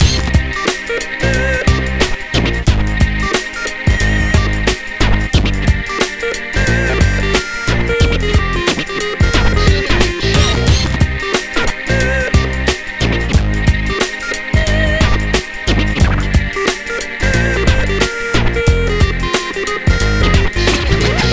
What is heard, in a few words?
lurking short